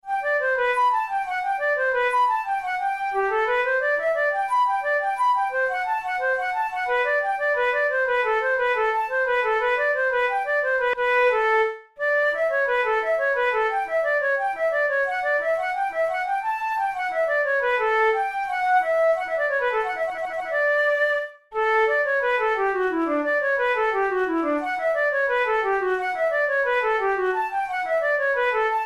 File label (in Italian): capricci per flauto traverso; ruge